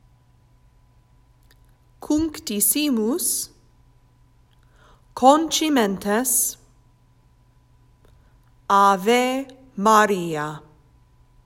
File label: chorus; Cuncti Simus